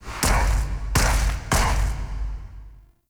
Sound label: Steps; Zeal Pre Intro Cin; Warframe; Emissary Season Two